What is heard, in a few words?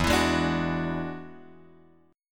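E 9th E, E9 Chord strummed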